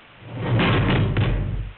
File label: multiple objects fall, heavy objects falling